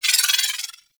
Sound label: Pistol Proj Whiz; weapons; Warframe; Thanotech